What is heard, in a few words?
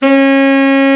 BRA TENOR, SAX; Best Service Gigapack 1; BR SAX 2